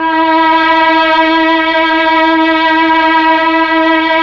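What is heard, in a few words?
Mellotron Strings E4, Tron Strings; Keyboards of The 60's and 70's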